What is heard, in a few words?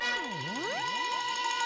Space Groove Intro; Runbow Pocket; 3DS